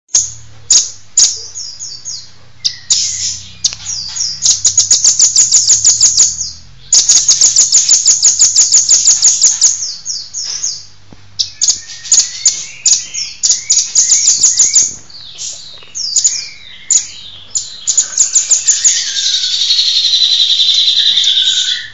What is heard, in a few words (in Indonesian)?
burung rambatan